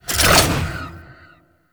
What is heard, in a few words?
Pistol Reload; Weapons; Warframe; Tenno; Temple Signature